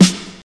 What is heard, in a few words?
classic good rap snare sample f sharp key